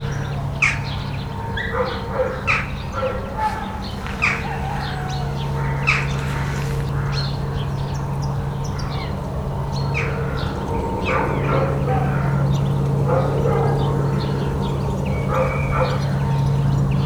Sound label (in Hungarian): csóka; máta; Hortobágyi Nemzeti Park 2013